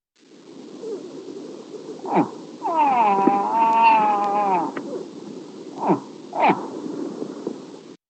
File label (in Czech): Los evropsky